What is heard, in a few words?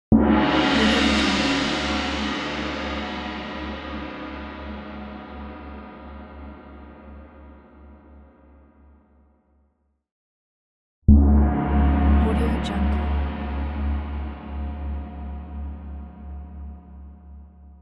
gong